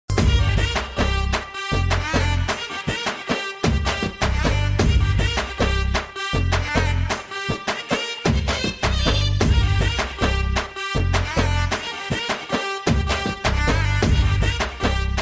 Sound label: title romantic bgm; sharwanand; rashmika mandanna